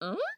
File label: koala question